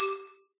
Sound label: brick combo; candy crush saga online